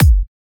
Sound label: Wobble Kick; 128BPM; Vengeance Samples; Wobble Kit